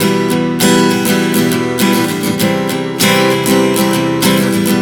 Prog 100 Em C, Acoustic Guitar, Chord Progressions 100 bpm